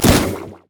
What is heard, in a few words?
blaze; LoL; brand zombie